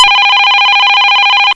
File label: Telephone Ring; Holy WarDialer 2.0